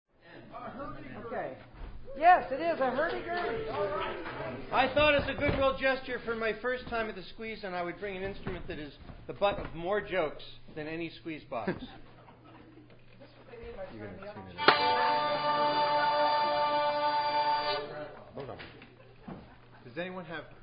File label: Hurdy Gurdy Intro; 2009 Concert